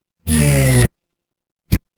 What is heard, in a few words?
fire electric zap